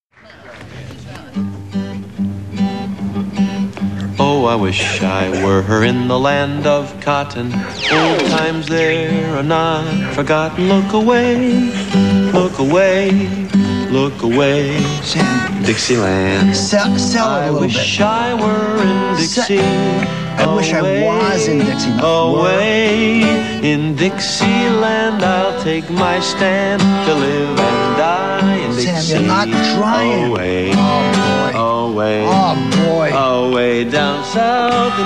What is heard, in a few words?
dixieland